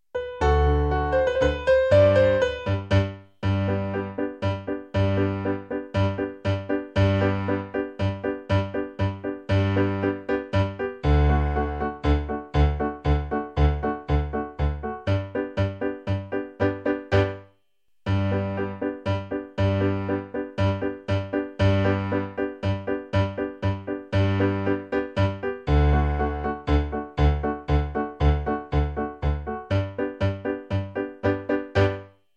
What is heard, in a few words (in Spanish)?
Popular aragonesa PIANO; musica II ESO 2015